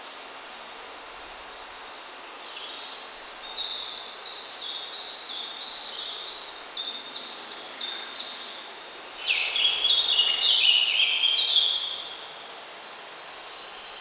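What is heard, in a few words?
Ambience Golf Crowd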